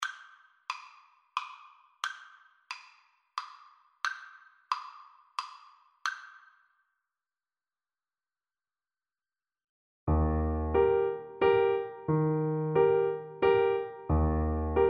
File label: blue danube FL kar, Flute